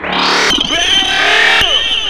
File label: WHERE VOX; Big Beat Samples & More; AKAI; VOX FX1 KIT